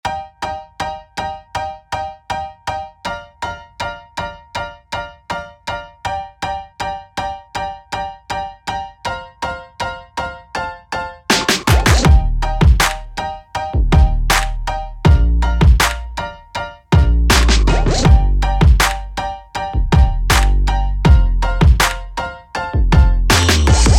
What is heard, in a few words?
Upbeat Piano